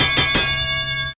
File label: boxing Bell